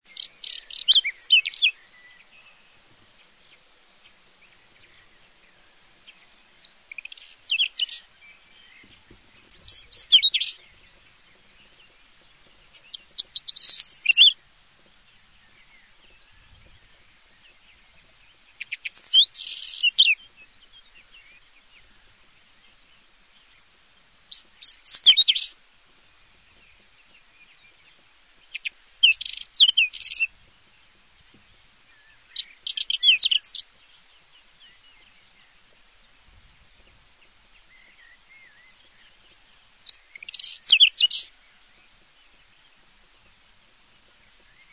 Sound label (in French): tarier des prés, chants